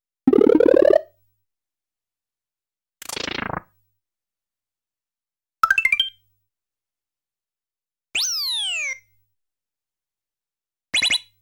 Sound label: The Vine Grows ~ Breakin' Bricks Sound ~ 1UP Sound ~ Get the Tape Cut ~ Stamping on; super mario world live